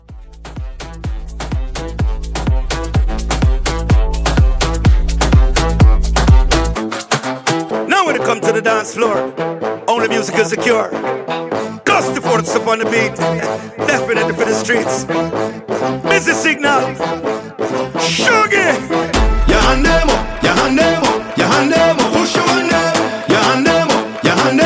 Club Edit, 126

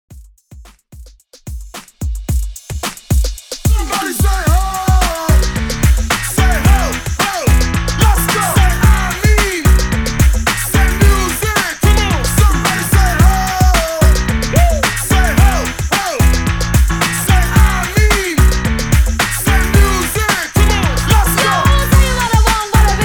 90S HYPE REDRUM 110 BPM CLEAN

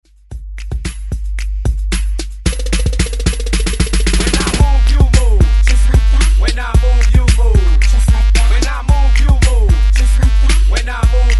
Hype Re Drum 112Bpm

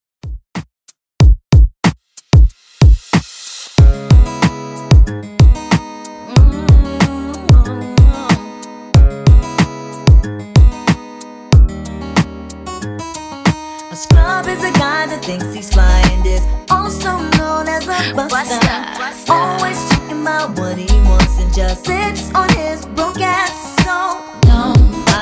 90s Redrum 93bpm

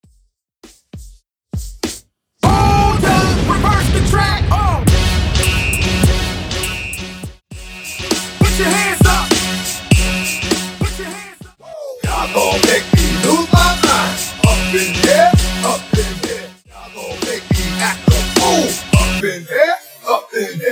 Mashup Breakz Word Play 100 103 BPM

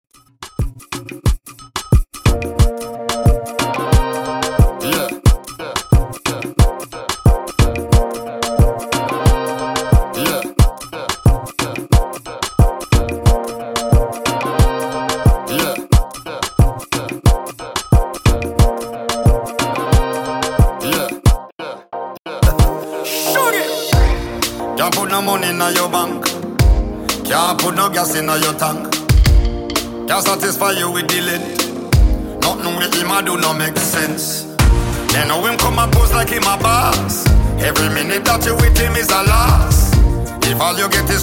In Outro Reggae 90Bpm

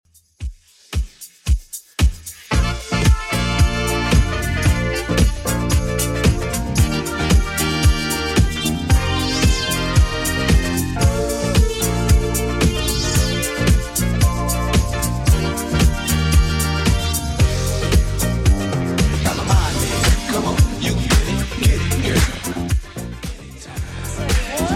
70s Redrum 113bpm

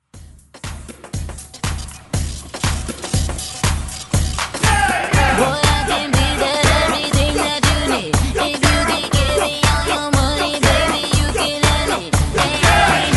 Hype Re Drum 120 Bpm

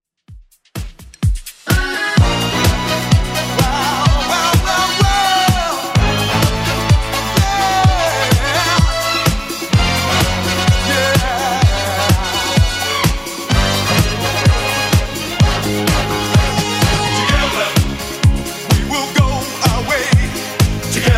70s Redrum 127bpm

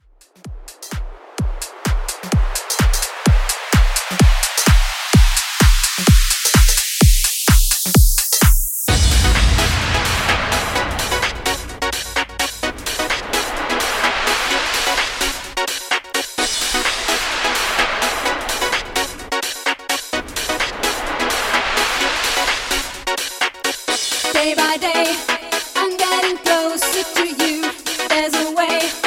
Throwback Redrum 128Bpm; djyan

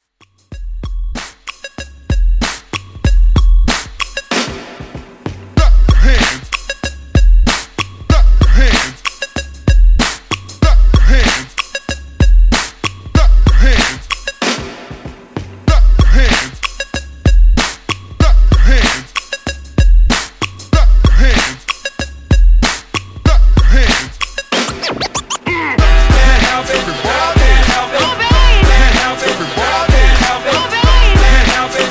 Party Starter 95Bpm

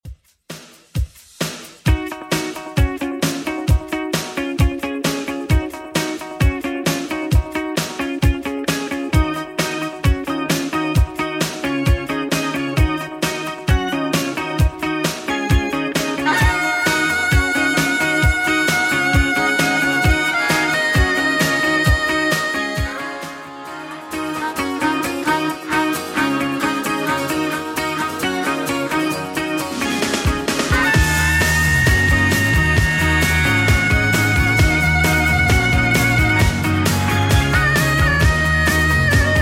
80s Redrum 132bpm

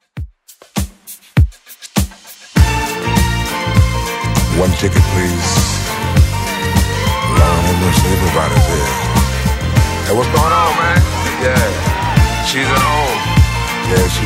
70s redrum 100 bpm

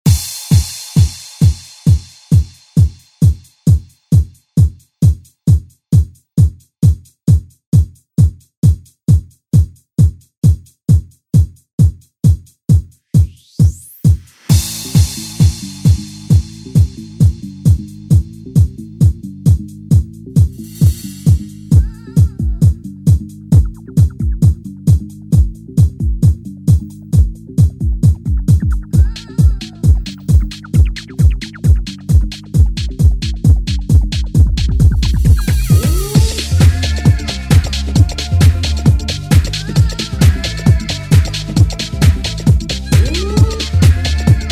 90s ReDrum; DJ Mhark

